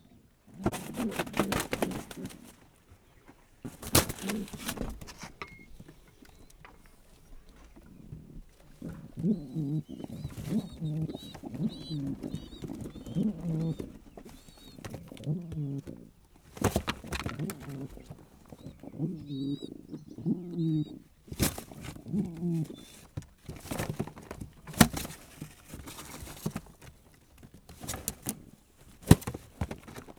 fiokait idegen galambtol vedo par, galambok; állathangok; paraszt udvar

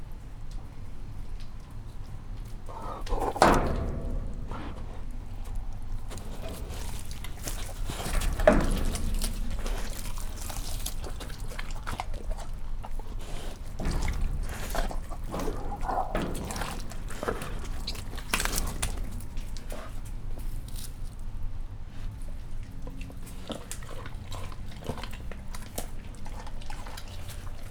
a rácson keresztül ráfog a húsra, megpróbálja megszerezni, foltos hiéna; jaszberenyi zoo 2016

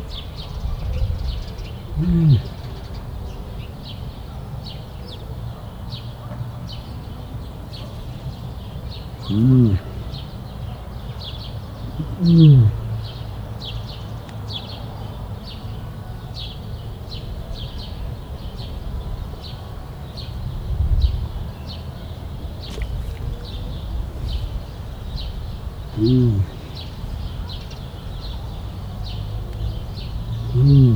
távolról ahogy dünnyög, oroszlán; marantz puska; debreceni zoo 2012

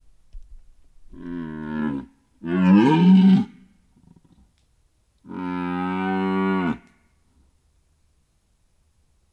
gímszarvas két rövid; budakeszi zoo 2010

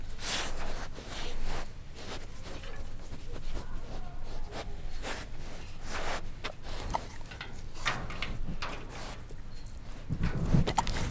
a rács külső részéhez közel tartott húst szimatolják, foltos hiéna; jaszberenyi zoo 2016

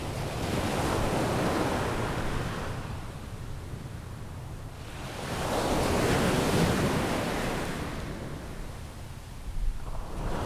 strand fölött felszálló repülő

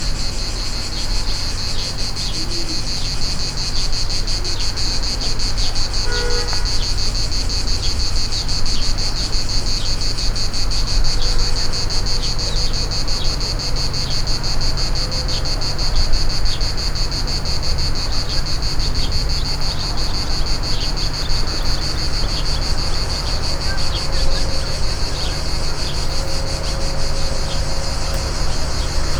hangos kabócák, távoli járműforgalom

